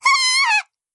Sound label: damage